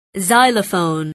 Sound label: xylophone